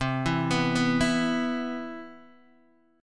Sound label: C Major 7th, Guitar Open D